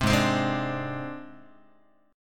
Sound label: G sharp Major Flat 5th, Guitar; G#Mb5 strummed; JGuitar